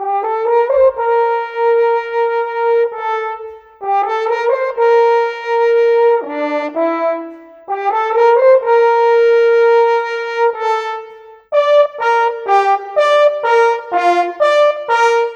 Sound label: Horns, Orchestral, Rock Pop; Sonic Foundry (Sony Creative Software); Orchestral Series VOL-4 - Rock and Pop